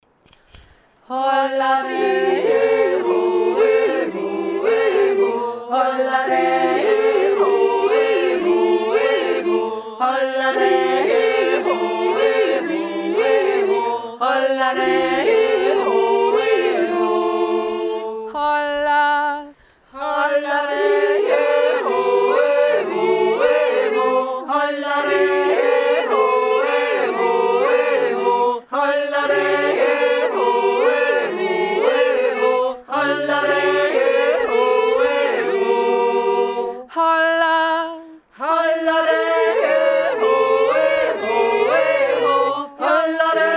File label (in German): dirndljodler